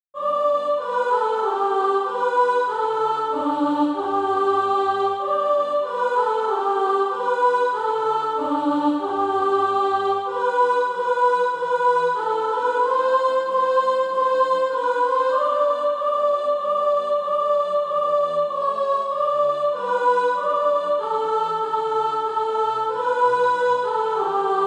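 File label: soprano, Practise Music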